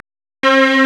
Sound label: STRNG; CMP - Analogon